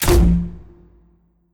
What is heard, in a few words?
Pistol Launch; Pistol Hero Helmet